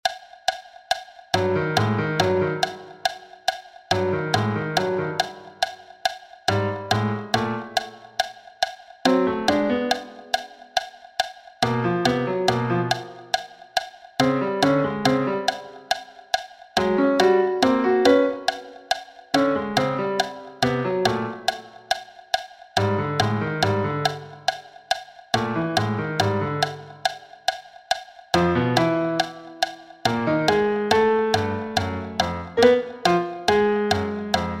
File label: click 70; Hoover